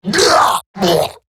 Death Eximus Grineer Male; Battle Barks

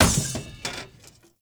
Stadium Cymbal Destroy; Warframe; Ambience; Nineteen Ninety Nine

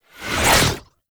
Impiety Riser; Warframe; Dagath

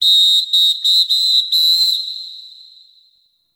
Beach Round End; Warframe; Grineer Beach

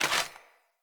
Chop Entrance Axe Pull; Sinecure

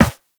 Run Metal, Footsteps; Warframe; Operator

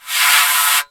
Ocean Steam Blast; Warframe; Grineer Ocean

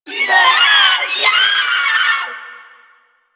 Volatile Runner Taunt Scream; Enemies; Abilities; Warframe; Proto Infested

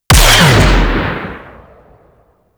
Shape Blast Shotgun Shot, Weapons; Warframe; Corpus

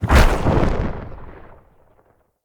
Vassal Laser Player Impact; Enemy; Characters; Abilities; Mendicant; Mnd King

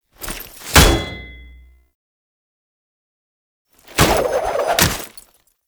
Idle Noble Speargun; Warframe; Dagath

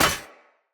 Short Sword Medium Impact Armour

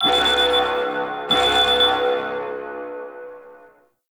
Anchor End Warning; Orbital Station; Warframe; Tau

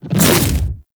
Infested Splitter Melee Swipe; Warframe; Grey Strain

